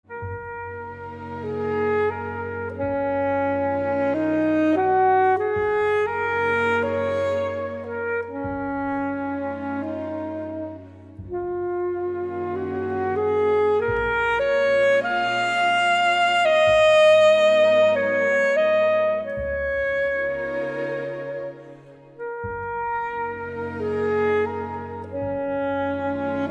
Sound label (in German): Konzert f Sopran u Altsax u Orchester